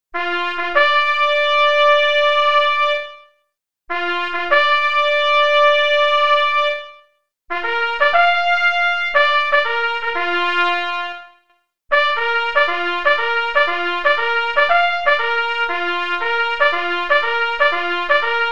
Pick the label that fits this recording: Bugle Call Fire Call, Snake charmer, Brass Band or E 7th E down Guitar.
Bugle Call Fire Call